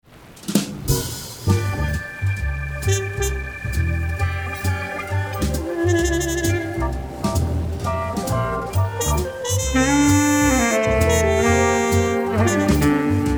Jazz Denoise

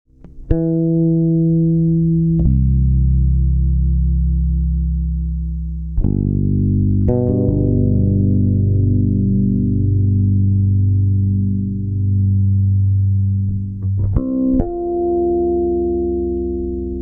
Comp full tone lo

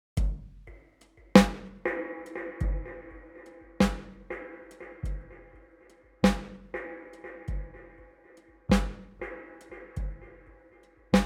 Blackhole iOS Drum; Pulsar

